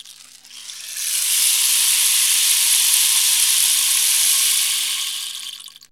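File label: RAINMAKER; DRUMS & PERC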